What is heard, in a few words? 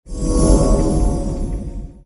Building Protoss